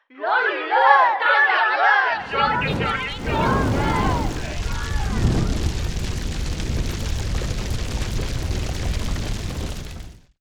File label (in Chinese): 童谣合并; bgm